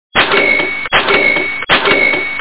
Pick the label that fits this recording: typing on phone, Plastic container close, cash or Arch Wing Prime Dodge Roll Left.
cash